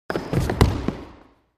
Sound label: Racquetball Foot, racquetball